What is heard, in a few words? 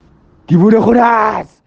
music